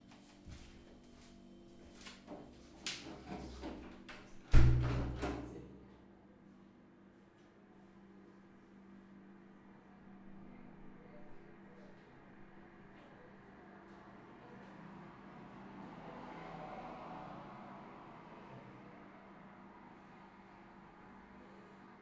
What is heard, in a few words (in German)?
Parikia (mit gekipptem Fenster); Perifereiakí; Greece; Fieldrecordings